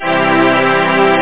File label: orc maj